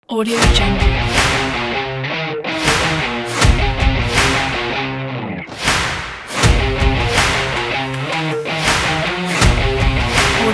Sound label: sport rock